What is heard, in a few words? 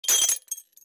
Chest; Idle Hero TD Tower Defense